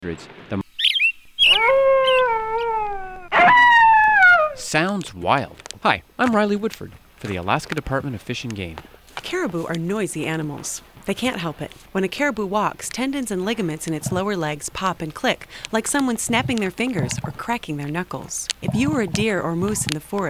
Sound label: noisy caribou